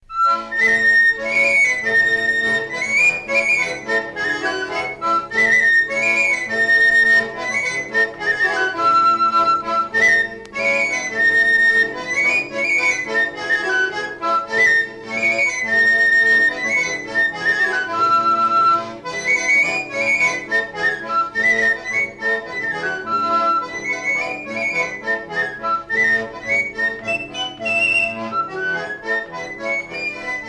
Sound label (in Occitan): gavota, accordeon